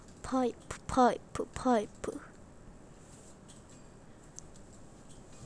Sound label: pipe